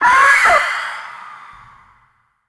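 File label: eagle voice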